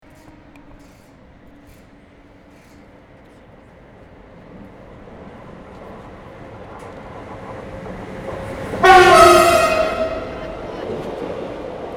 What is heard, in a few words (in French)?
train ki passe avec klaxon